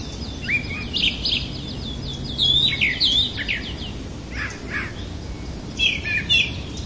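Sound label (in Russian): звонкое пение пересмешника в дикой природе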